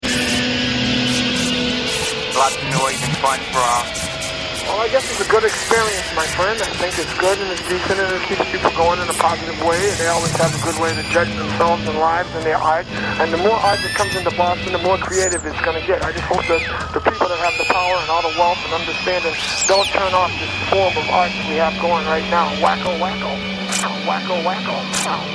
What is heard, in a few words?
orgy of noise